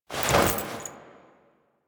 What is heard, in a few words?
Move Foley Short; Warframe; The New War; Veso; Quests